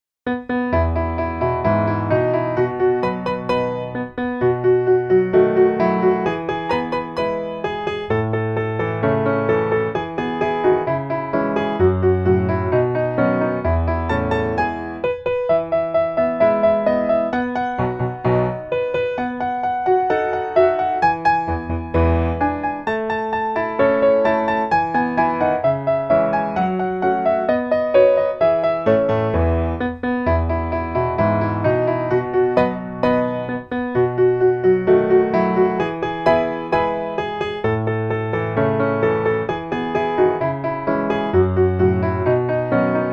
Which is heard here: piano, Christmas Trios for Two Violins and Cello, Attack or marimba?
piano